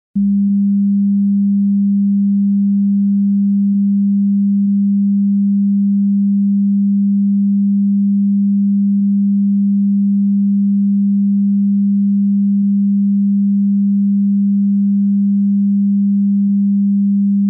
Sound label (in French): sinus 200Hz en phase; test bluetooth